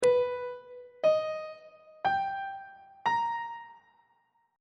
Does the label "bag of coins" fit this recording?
No